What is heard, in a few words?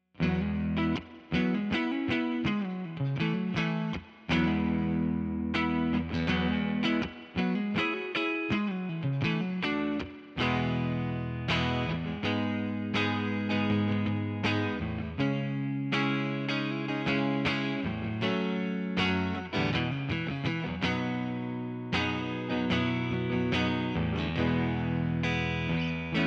Neck Clean, electric guitars; Gusta GST Standard; gst models